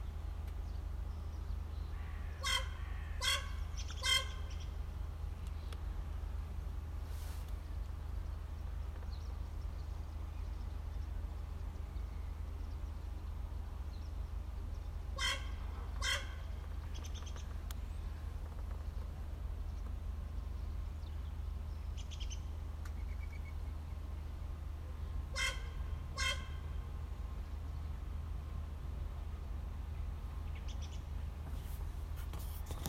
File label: Bird brr-brr